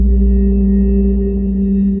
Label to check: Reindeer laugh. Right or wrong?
Wrong